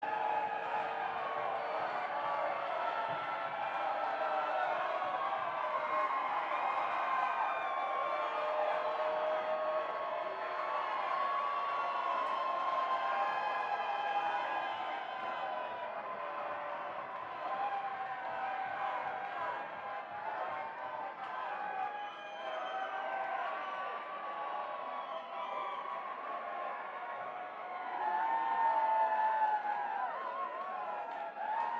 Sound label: Crowd Banter